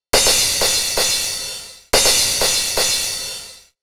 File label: perc niner 125, Percussion Loops, Classic Chicago House